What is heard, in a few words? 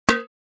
Cooking pan light hit impact; utensil